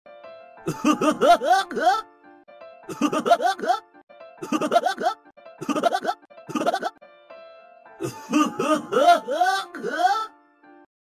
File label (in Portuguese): risada nerd